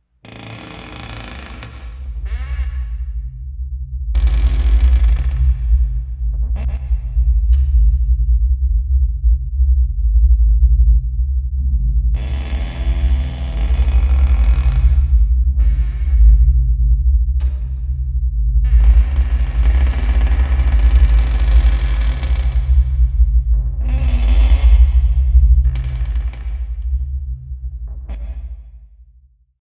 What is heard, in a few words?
attic rumble; Submachine 4